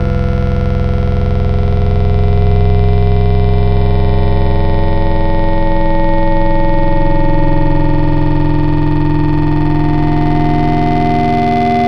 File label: Metallic Waves; Wavetables; Synthetic's Serum Collection; ESW Core Wavetables; Echo Sound Works Core Tables